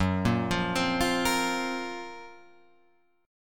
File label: Gb Major 7th Flat 5th Guitar Open F; GbM7b5 arpeggiated; JGuitar